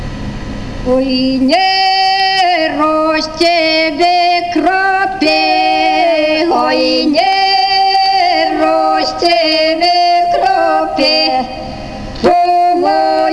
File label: vocal